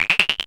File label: Digi frog